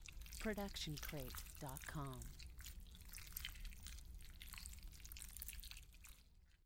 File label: stylized squish; soundscrate